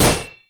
guard; library of ruina

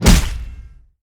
hit; library of ruina

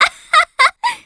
laugh

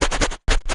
notepad write; heita

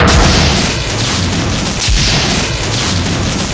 aura powerup

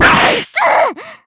damage; erika

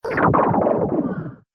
disappointed

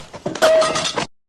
tripped

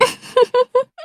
giggle; erika

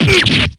pain; shovel

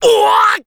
uwah; Hazama

